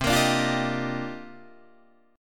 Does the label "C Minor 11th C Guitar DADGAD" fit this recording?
Yes